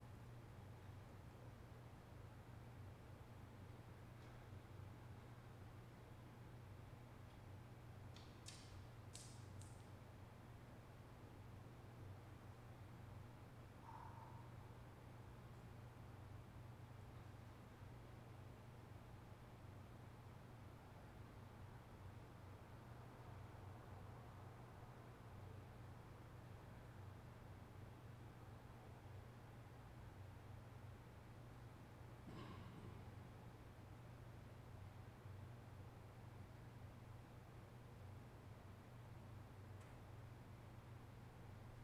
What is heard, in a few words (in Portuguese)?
Ambiencia biblioteca unb vazia com transito bem longe